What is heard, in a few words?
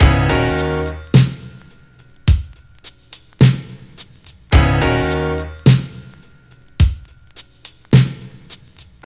53 bpm breakbeat sample c sharp key, kick tuned to the C# note